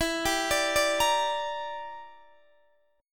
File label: E Minor 7th Flat 5th, Guitar, DADGAD; chord shape